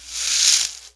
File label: leaves, plant